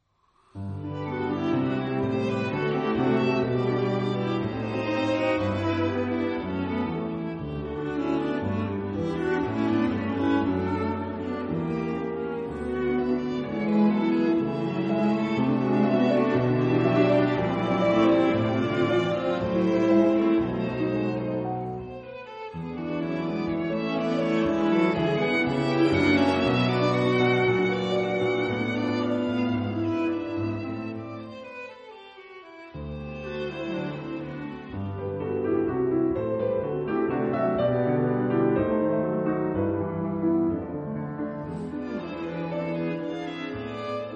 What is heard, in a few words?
Quatuor piano